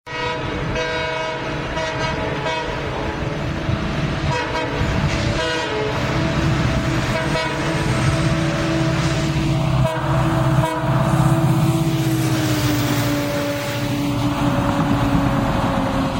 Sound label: Loco Passing; Wdp 4